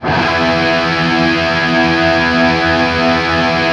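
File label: power E loop, guitar power chord loop; East West; Steve Stevens Guitar Samples Collection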